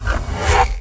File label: jumppad